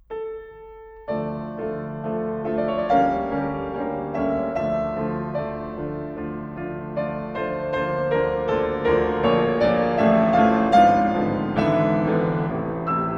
D major for piano 4-hands; Ludwig van Beethoven - Complete Works [Brilliant Classics 100 CD Box]; 6 Variations on ''Ich denke dein''; WoO 74 - Var.5

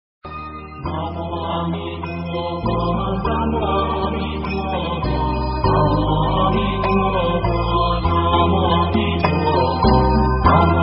Amituofo, 432hz; Nhạc-Phật-Giáo-432hz

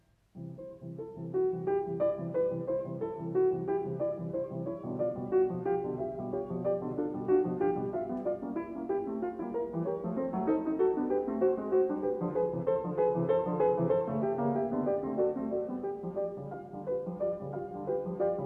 Waltz in C major, Poco allegro; Ludwig van Beethoven - Complete Works [Brilliant Classics 100 CD Box]; Piano Variations IV; 33 Variations on a Waltz by Diabelli in C major Op. 120

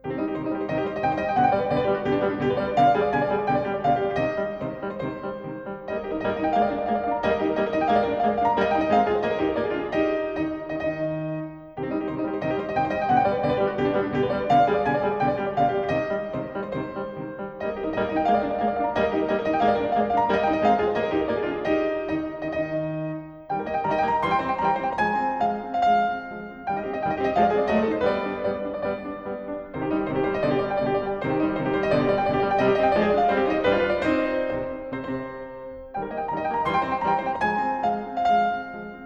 piano; 9 Variations on March of Dressler WoO 63 - Var. 1; Ludwig van Beethoven - Complete Works [Brilliant Classics 100 CD Box]

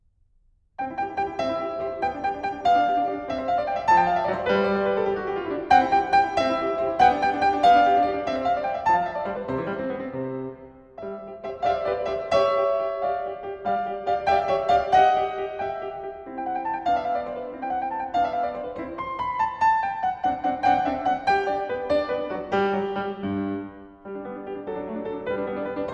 Rondo in C major, piano; WoO 48; Ludwig van Beethoven - Complete Works [Brilliant Classics 100 CD Box]

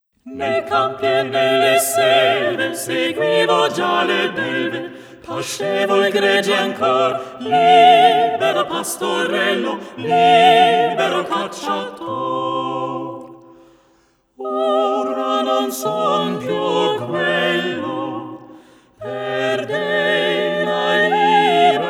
Mehrstimmige italienische Gesange; ''Nei campi e nelle selve II''; Der Glorreiche Augenblick Op.136; WoO99

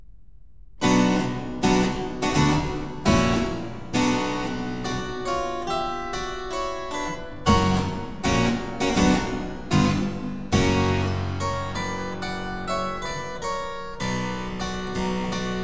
Funeral March in Cm, piano; Wolfgang Amadeus Mozart; Piano Variations; K.453a

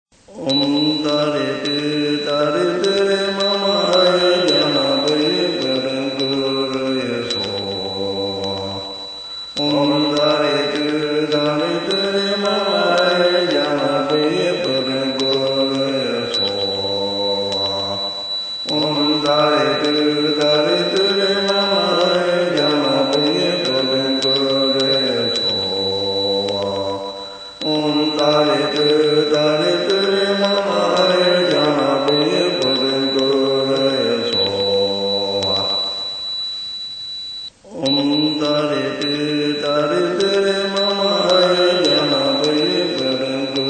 White Tara Mantra

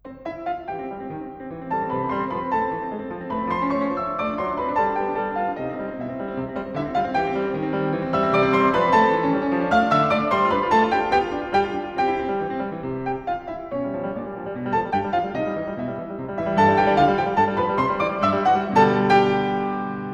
C major, Menuet; 12 Variations; ''A la Vigano'' by Haibel WoO 68 - Var. 3; Ludwig van Beethoven - Complete Works [Brilliant Classics 100 CD Box]; Piano Works

